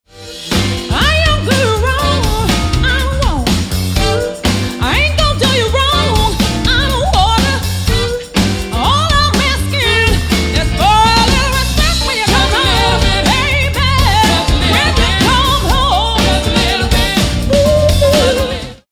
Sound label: Soul Band; Surrey